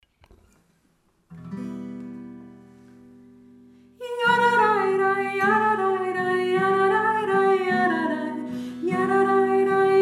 nigun; mod itz polen

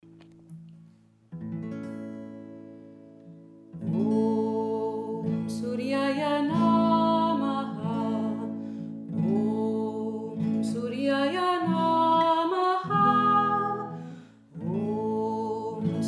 om suryaja

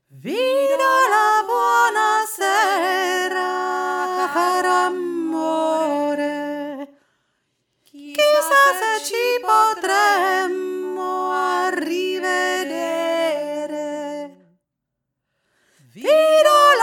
vi do la buona sera obere stimme

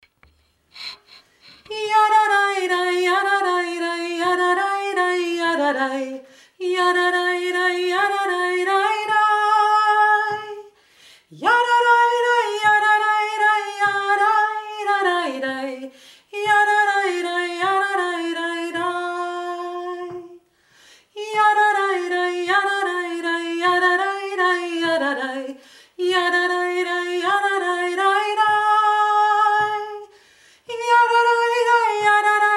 nigum

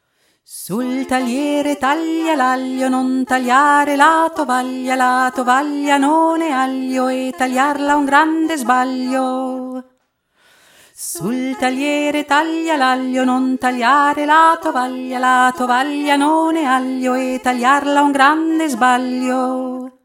taglia l'aglio unterste stimme

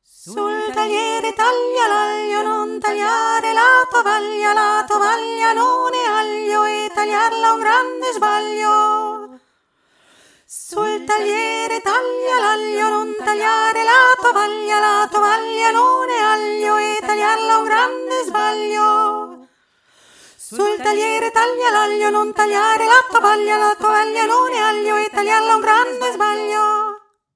taglia l'aglio obere stimme

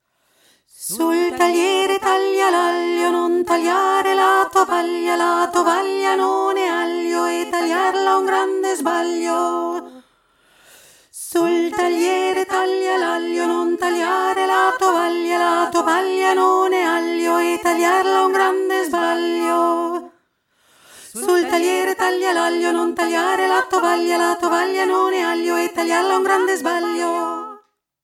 taglia l'aglio mittlere stimme

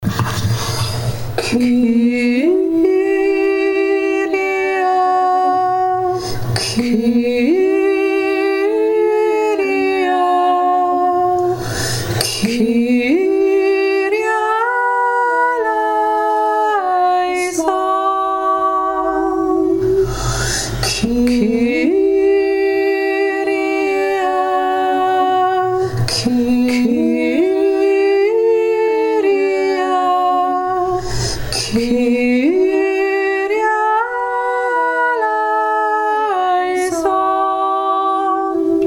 kyrie eleison

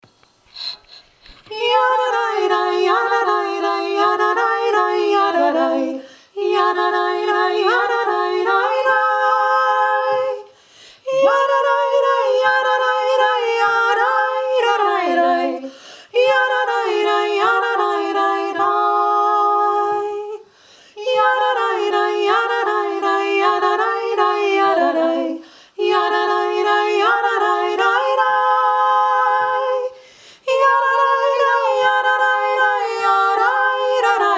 nigum

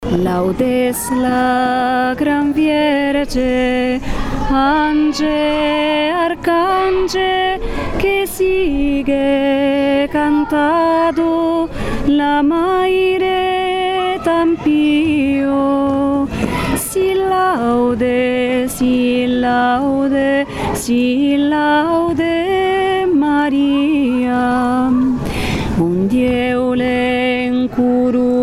laudes

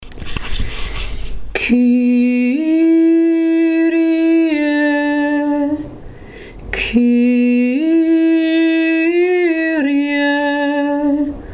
kyrie eleison

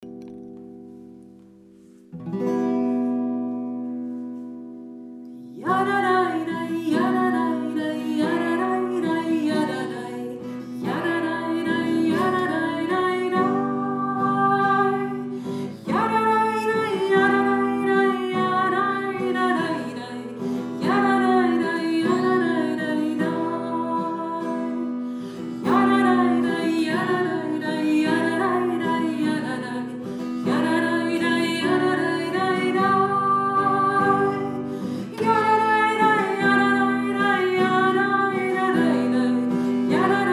nigun; mod itz polen

